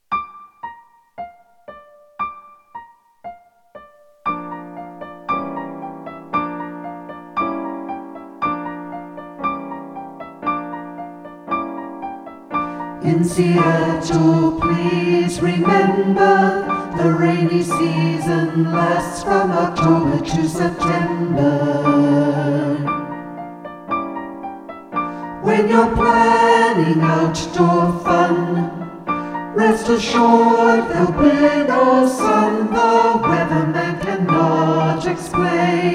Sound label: Rain SATB all vox